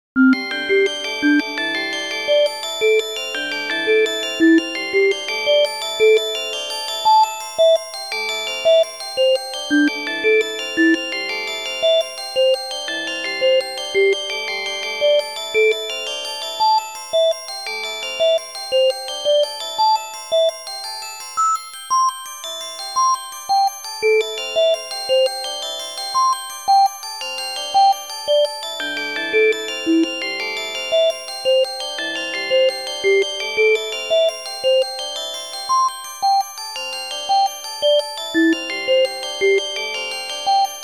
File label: glockenspiel oboe with ocarina glock; fractal music